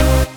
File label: Synth Shot C